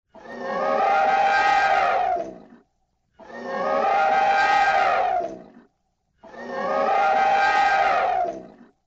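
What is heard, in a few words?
elephant imitation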